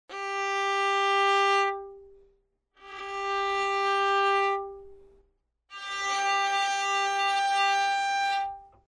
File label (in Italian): Tastiera Ponticello